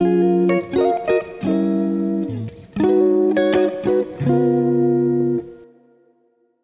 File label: Electric Guitar Wet 85 BPM F# Maj; Melodics; Cymatics; Eternity Sample Pack; Guitars - Electric